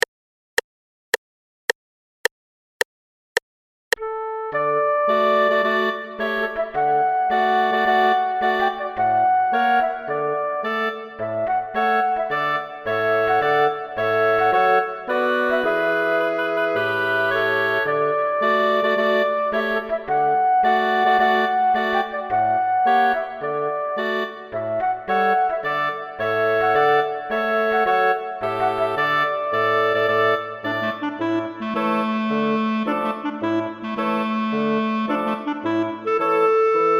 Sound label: march woodwind quartet; aida march; graduation recessional